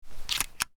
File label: Super Shorty Load, Weapons Firearms